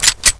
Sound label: elite left clip in, weapons; cstrike